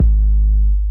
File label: dope kick single hit g sharp key, steel kick drum sound tuned to the G# note; kick drum samples